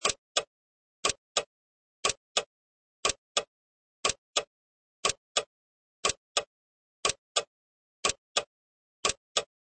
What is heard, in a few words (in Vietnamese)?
am thanh tieng dong ho chay